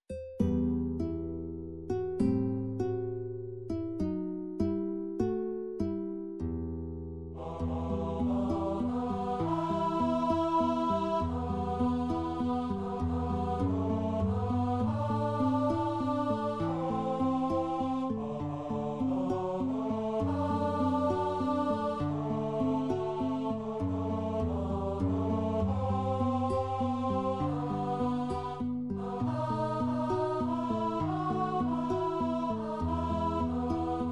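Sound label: guitar; Gospodj estj moi Pastyrj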